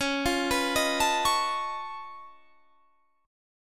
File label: C sharp Augmented 9th C sharp down, chord